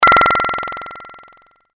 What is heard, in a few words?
metallic pulsing